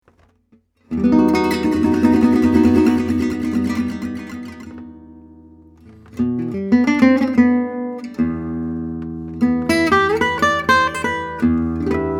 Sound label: Guitars Sample, gypsy guitar